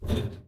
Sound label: boots metal; Apotheorasis